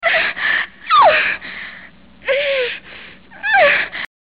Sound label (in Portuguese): gemido